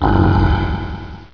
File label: recharge